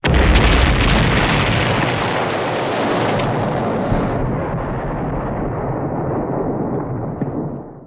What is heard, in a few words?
Eagle Crash